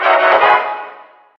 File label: Card Normal Stinger